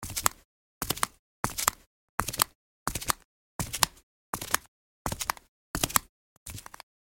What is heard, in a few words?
Flip flops walk on concrete